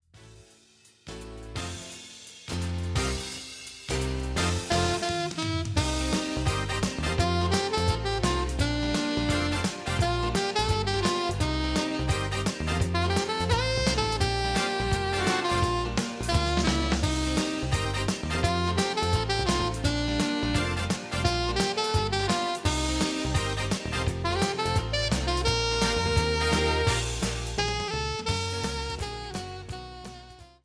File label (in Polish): sax alt